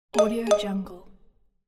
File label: cartoon blink